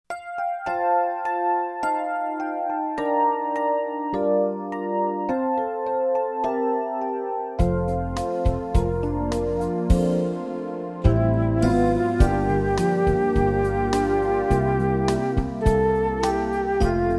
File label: instrumental; He Is Lord